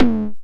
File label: Stabs, Elektron MachineDrum; SPS1 MKII